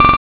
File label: Computer Drill; FREE SFX PACK VOL 2